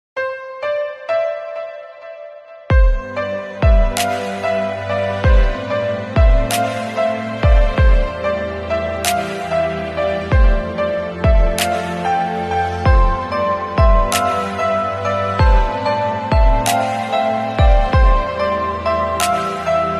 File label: Mobile Ringtone